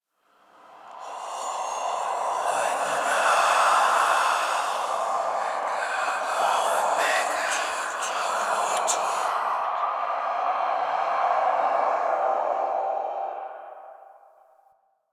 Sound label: Whisper Blood Enemy; Ambience